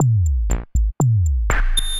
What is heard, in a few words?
Arp2600, Drums; Ableton; DM ARP 2600 Drums by Flatpack; FP-DM Arp2600 K7